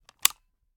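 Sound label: weapon selector on; gmod; foley; uzulu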